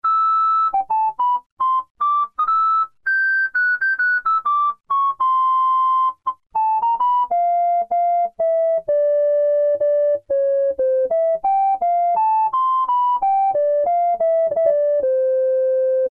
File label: Recorder, Roland Juno-106; Factory Preset